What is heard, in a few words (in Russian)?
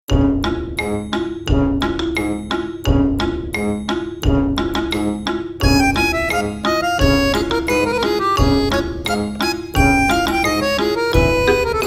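veselaya smeshnaya muzyka, cheerful funny music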